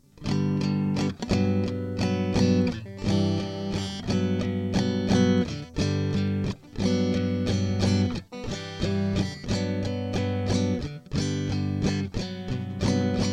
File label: G Gmaj7 G6 Gmaj7, guitar